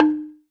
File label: Bamboo Marimba D#3